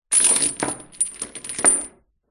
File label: industrial chain large set down on hard floor; zapsplat